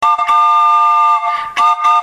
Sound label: boat, whistle